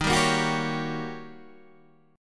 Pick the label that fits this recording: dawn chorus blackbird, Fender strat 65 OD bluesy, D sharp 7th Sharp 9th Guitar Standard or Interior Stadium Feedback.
D sharp 7th Sharp 9th Guitar Standard